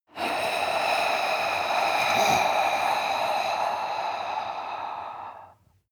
breath female exhale heavy scratch

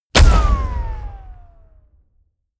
shot

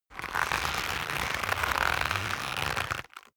leather creak long medium

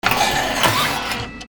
machine burst

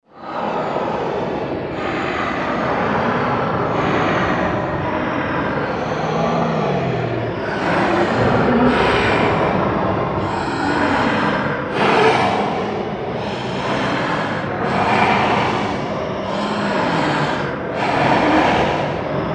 demon breath loop

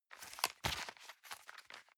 envelope swap medium

